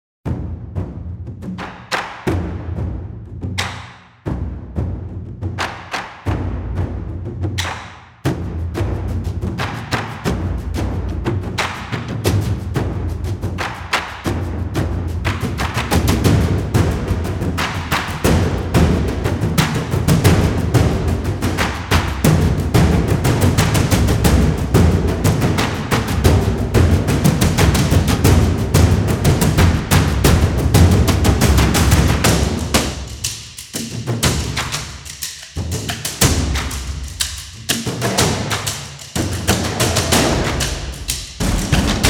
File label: Drums Drums Drums